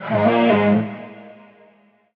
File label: vocal shallow G#m; SD AURORA Sample Pack; Slate